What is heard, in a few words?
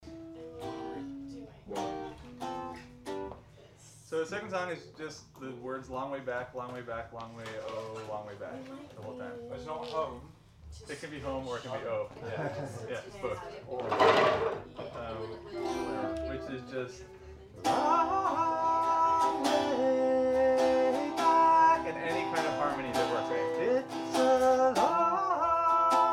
Rehearsals